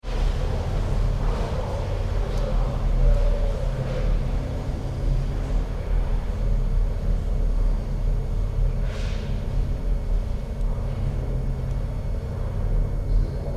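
Police Station Atmosphere, Very distant voices - aircon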